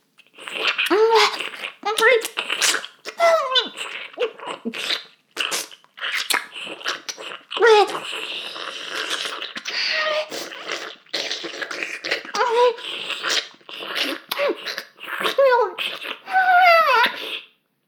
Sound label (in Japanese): フェラ音声; 牧場戦腺; 少佐殿